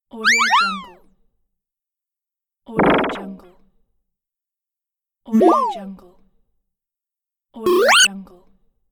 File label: playful sound effects